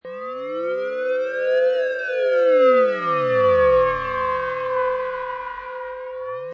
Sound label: Space Scifi Sound In Orbit, Sci-fi, slowly oscillating; siren-like space sound. Alarming.